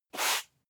broom sweep single

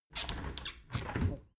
window open regular sequence

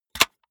cassette case close hard

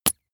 bath duck hit

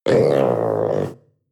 panther young grunt deep

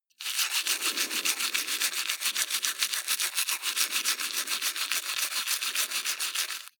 teeth brush wet open front circulate

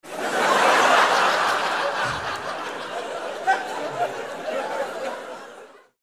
laugh group

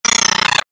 metallic seagull

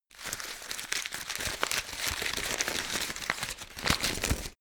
paper crumple

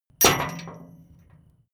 metal chain impact container

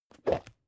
plastic bowl small open rough fast

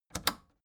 record player power click off fast

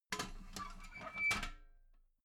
metal ladder small handle unfold soft

